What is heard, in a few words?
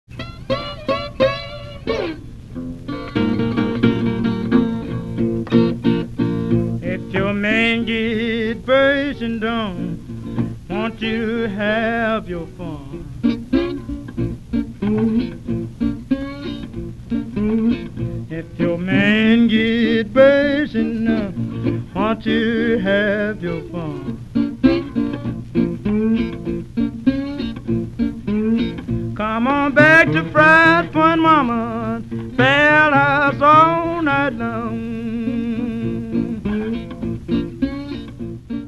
Blues